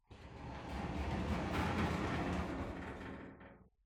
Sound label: bathroom sliding glass shower door open